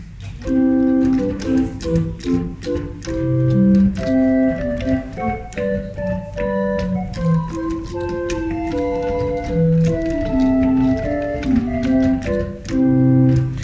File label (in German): Englisches Positiv, pipe organ